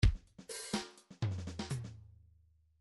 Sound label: midi fills funk